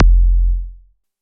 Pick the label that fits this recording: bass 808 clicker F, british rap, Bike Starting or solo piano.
bass 808 clicker F